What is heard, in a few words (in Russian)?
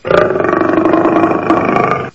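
устрашающий рев для отпугивания врагов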